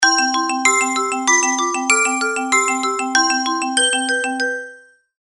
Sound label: Chiming tone, Samsung SGH-i900 Omnia